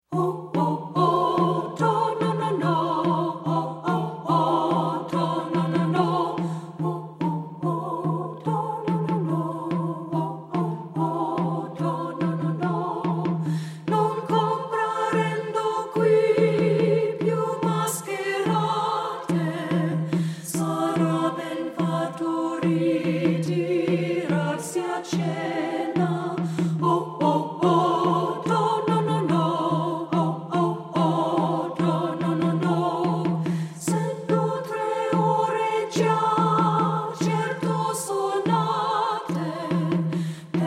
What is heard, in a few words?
ALTO